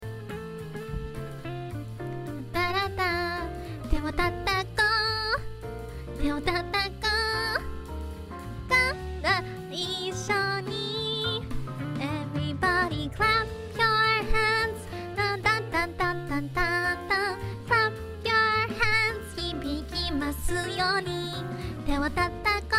Singing; IRySinging

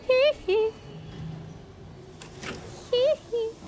hee hee; noises

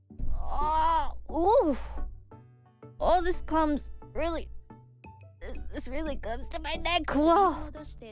stretching

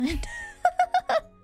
laugh

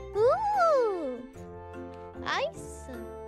wooooh ice

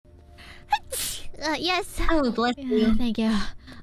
Sneeze

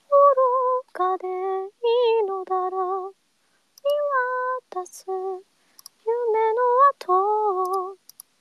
Singing; SpaceRyS; IRyS

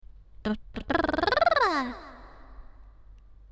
brrrrrrrr

